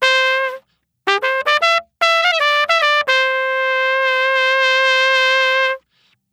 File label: Trumpet Solo; соло